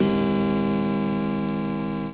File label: Grandfather Clock